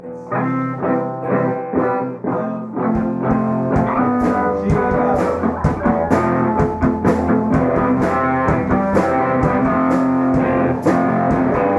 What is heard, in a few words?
wind driven rain; tues Night Riffs